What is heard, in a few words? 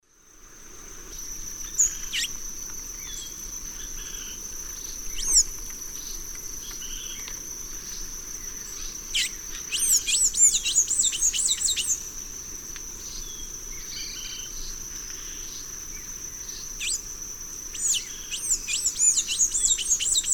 Thraupis episcopus, song and flight call; S. America; Eastern Lowlands